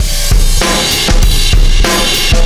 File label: Wormhole Beat, Processed Drumkits